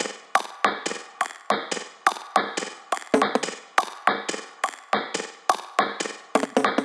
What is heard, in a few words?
Bpm140 Perc Loop, Dubstep; Fluent